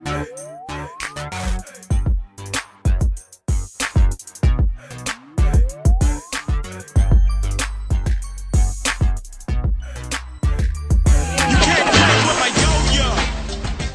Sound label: Music; Unsung; Unsung Hollywood